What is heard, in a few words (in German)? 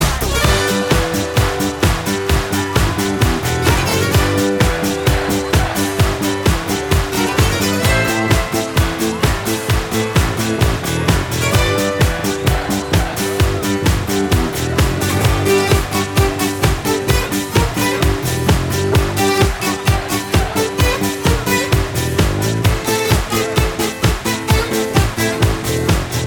Disco Bouzouki